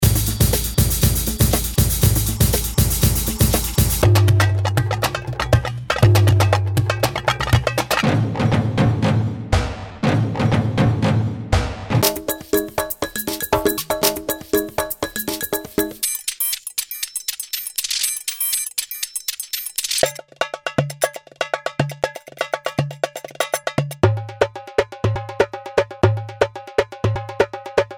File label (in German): Klangbeispiel PLP120